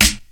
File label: Short Snare Drum D sharp Key, Royality free acoustic snare tuned to the D# note. Loudest frequency: 4804Hz